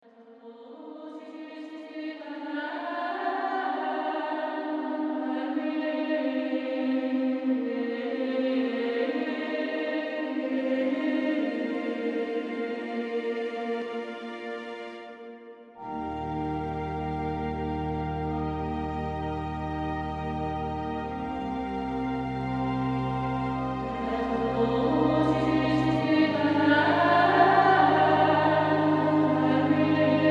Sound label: Gregorian woman